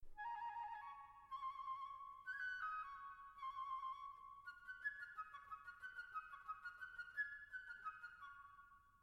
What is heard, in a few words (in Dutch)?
fluit